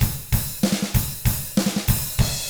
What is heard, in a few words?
HARD ROCK, Drums; ILIO; Double Platinum Drums 1